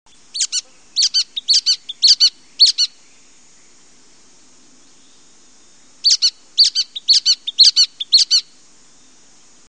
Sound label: BHnut, bird sounds